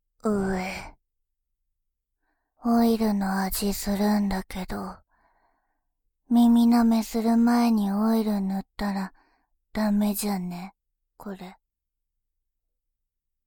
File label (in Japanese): 耳なめ; メイドさんの耳なめ